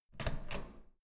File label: door handle use hard